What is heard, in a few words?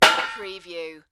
Metal Impact - Hit Metal Bar With Rock